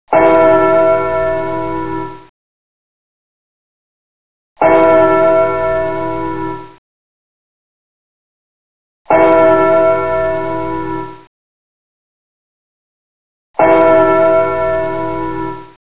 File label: church bell